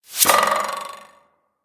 Arrow, notifications; Huawei; Honor 3X Pro

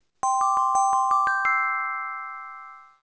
Alert; Samsung GT-C6620

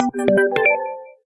notifier; ZTE Open C; Prism

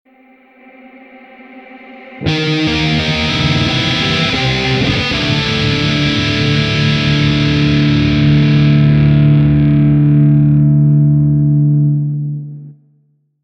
Dark Metal, ringtones; Marshall; London KB 1501

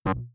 Keypress Invalid; Vsmart Star 4; ui

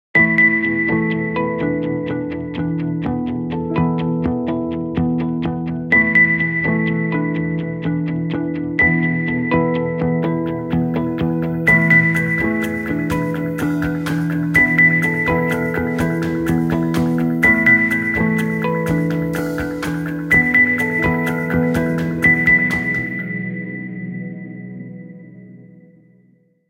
Clock Eseries, Nokia Tones; Windows Phone apps

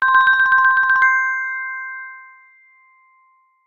Classic, ringtones

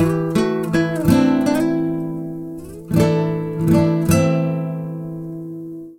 Game Over Guitar, ringtones; Koobee; P1S Pepsi

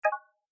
Electricity fold open; Nokia; audio themes

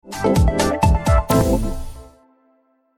Message tone

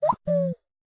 Owl, notifications; Samsung Galaxy S26 Ultra; Fun

